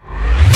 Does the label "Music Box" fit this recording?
No